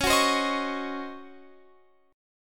Db Major 7th Suspended 2nd Suspended 4th Db Guitar Open F; strummed